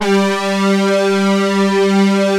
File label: SYN, analog